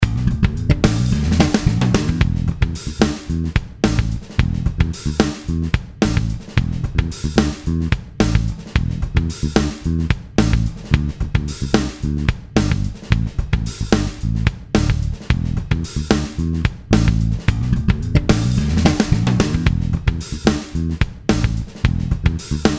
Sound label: Funk 110